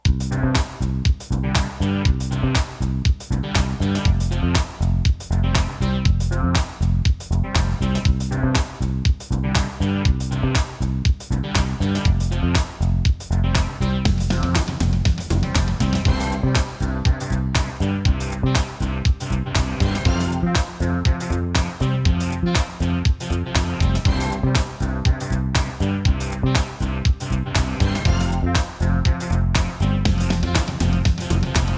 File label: House